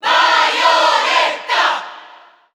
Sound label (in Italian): Cheer Italian; Bayonetta; SSBU